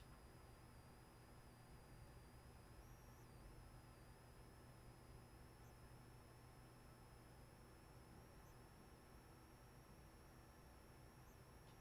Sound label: interference, mono